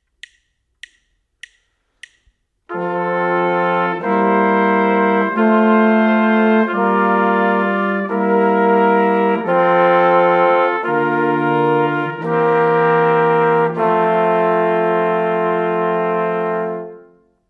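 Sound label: harmonic dictation, brass quartet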